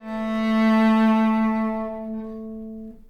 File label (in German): Cello